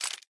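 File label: inventory items ammo; STALKER; IWP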